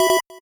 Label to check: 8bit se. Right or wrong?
Right